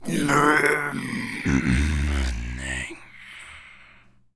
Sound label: zombie carrier death